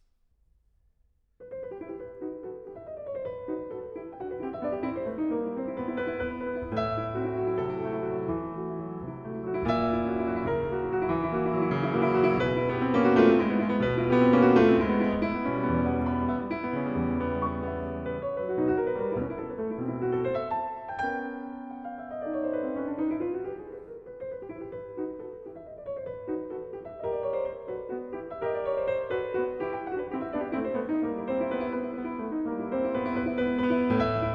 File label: Allegro Scherzando, Piano; Poetic Tone-Pictures, Op. 3 VI.; Grieg Complete Piano Works